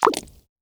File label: Chaser Grab